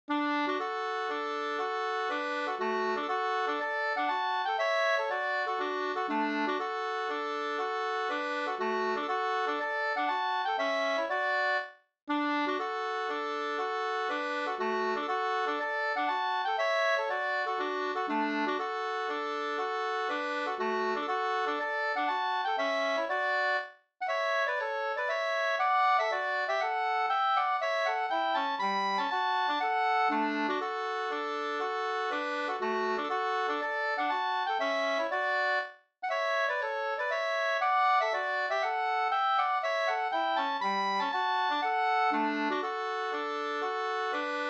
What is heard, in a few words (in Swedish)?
hamburska; Leffler 8del Nr118